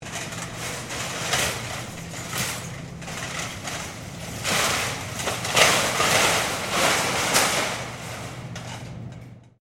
SHOPPING TROLLEYS MANOEUVERING STEREO, Supermarket shopping trolleys; Stores And Mall Sound Effects